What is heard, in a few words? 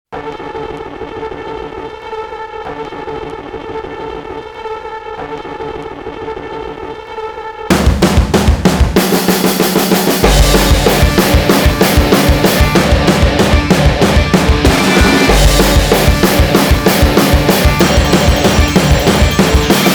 (Instrumental)